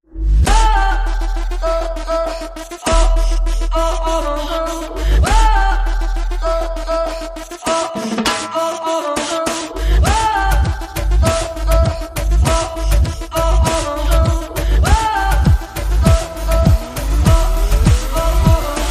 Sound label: Bomba Beat